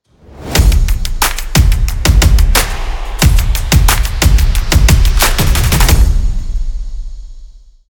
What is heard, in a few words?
Energetic percussion